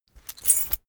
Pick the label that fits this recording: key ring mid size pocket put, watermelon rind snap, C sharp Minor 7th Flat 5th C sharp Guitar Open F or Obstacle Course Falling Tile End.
key ring mid size pocket put